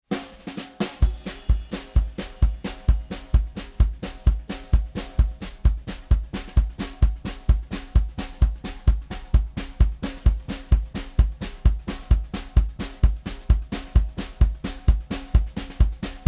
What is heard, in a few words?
130 country